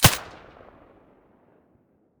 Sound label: silencer; S.T.A.L.K.E.R.; IWP; vityaz; pp1901